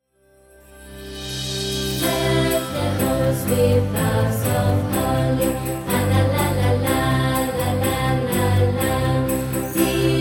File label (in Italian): Contralti